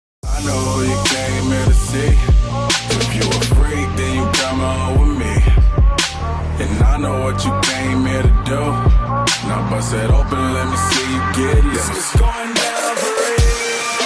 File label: cheer mix; junior cheer